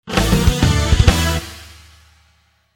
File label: jingle, music; VirtuaTennis; CyberMycha 2002 May